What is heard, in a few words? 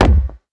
ball impact ground